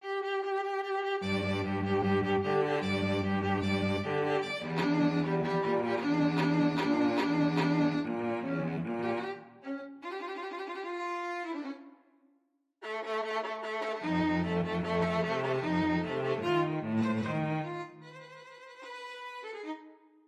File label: violin cello duet